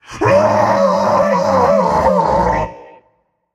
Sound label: fear, voicepack; smite; jorm